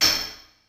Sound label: MEGA KIT, DRUMS; Kid Nepro; Elite